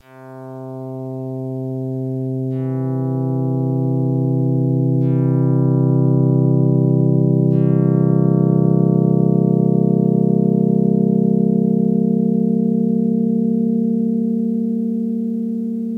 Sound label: harmonic dom 7th swell fade